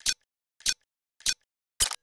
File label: countdown